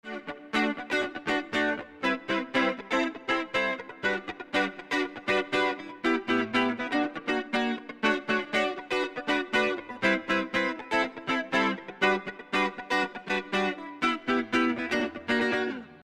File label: Guitar Rhythm Dual Mode; SRE330